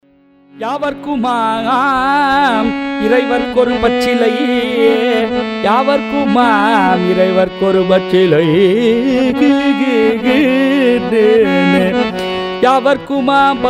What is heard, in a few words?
bhajan; Shivanama Sankeerthanam; sanskrit; yavarkkumam iraivarkku